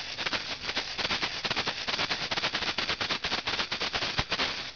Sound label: globe shakers; percussion; toca